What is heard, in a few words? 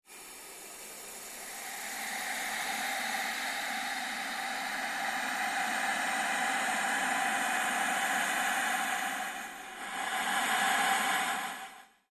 SURGE FADE, Sound effects created by manipulating other sounds; Rises and Dives